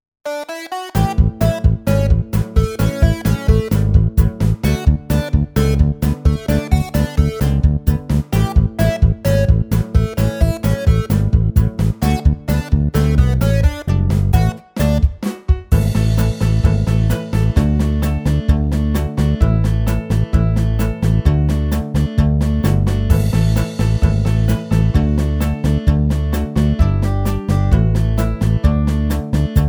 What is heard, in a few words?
Karaoke